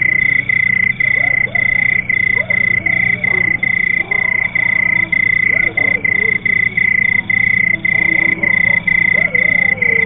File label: ambience night; cstrike; cs evian